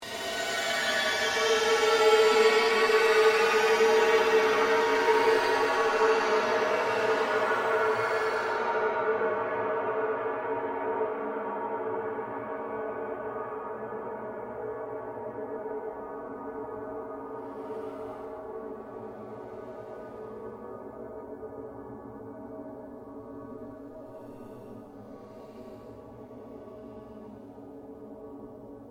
surge fade slow, Various sweeps, pulses and drones; Rise And Dive; Rises and Dives